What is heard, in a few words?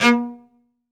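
solo cello, strings; ILIO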